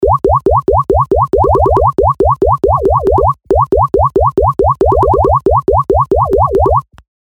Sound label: Laser Loop 138 BPM; Laser Loops